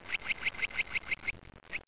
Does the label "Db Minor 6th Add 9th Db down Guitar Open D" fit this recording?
No